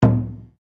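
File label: Drum Taiko mezzo forte, Japanese Taiko Drum; Miscellaneous Musical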